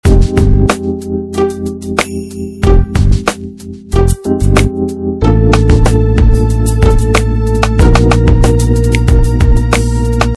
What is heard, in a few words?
93bpm